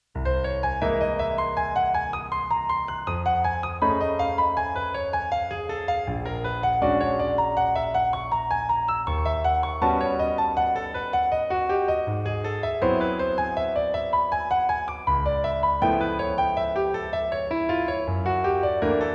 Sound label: Altered Scale Study; Jazz Piano